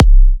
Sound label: C kicks; markus hakala kicks